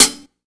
hat pedal; Sonic Foundry (Sony Creative Software); Ugly Remnants VOL-2; Beatstuff

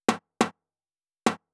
drum Loop 140BPM Tom; Vengeance - Essential Clubsounds Vol.5; VEC5 Drumloops; VEC5 Multi Loops 140BPM

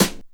Dance Mega Drums, KIT D+B; Best Service

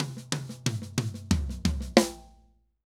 Drums Poly

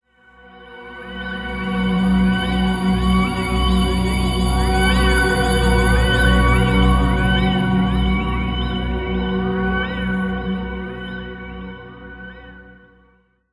Woodnotes B, Ambient; Zero-G Seismic Frequencies; 90 s Sample CDs

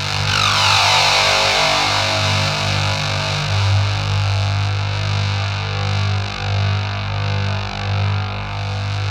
ZWEEP; Spectrasonic Distorted Reality 1

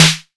hiphop kit; Wizoo - Platinum 24 Electronic

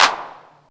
127 DRM, Fresh Disco House

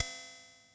Open Hihat, Yamaha VSS-100; 300 Drum Machines

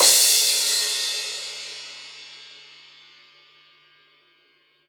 Crash, Cymbals

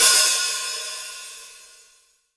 HH, STUDIO STEREO HI HAT

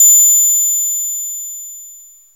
HI PING, MACHINE PERC; Zero-G Ambient 1

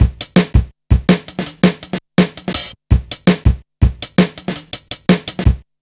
165, Drum Loops, Drum & Bass; Total Drum&Bass

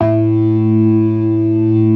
ORGAN, C3 PERC; Keith Emerson Signature Series

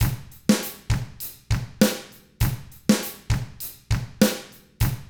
ROCK, Drums; ILIO; Double Platinum Drums 1

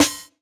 Snare, jungle breaks drum sounds

